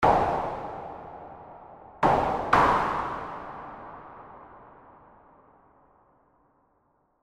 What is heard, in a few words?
tuned noise